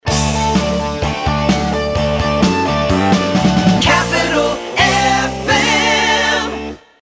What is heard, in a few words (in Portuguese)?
Vinheta Rock; Radio Capital FM